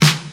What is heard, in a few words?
dirty snare single hit e key